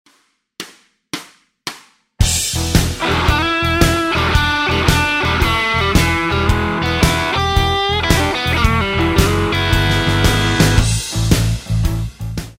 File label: Roadhouse Licks Lick, guitar; 20 Roadhouse Licks